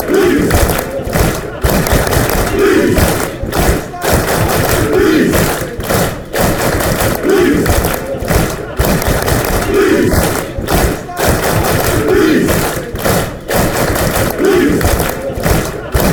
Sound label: leeds clap, fanchants